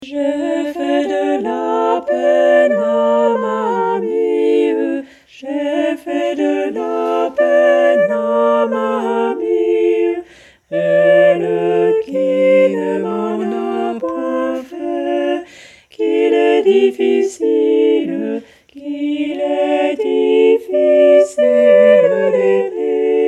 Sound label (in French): Le Doux Chagrin Tutti; Version Chantée